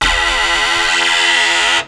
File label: TECH KIT, RAVE; Rubber Chicken Software Sample Bank